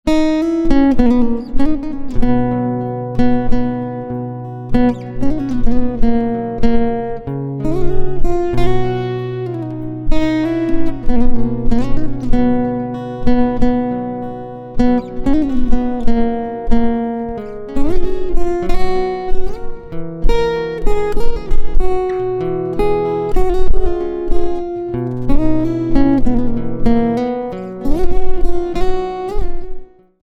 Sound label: Guitar Silent